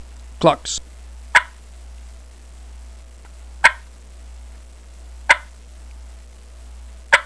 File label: clucks, turkey calls; calls mouth quad; shockin cutter